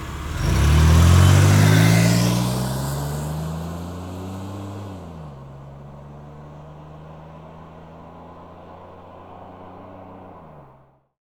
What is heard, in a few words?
DRIVE AWAY, Car; E-MU Producer Series Vol. 3 – Hollywood Sound Effects; Transportation; VSI Car